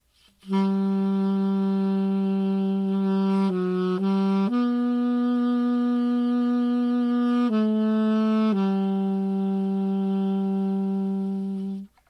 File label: first phrase, saxophone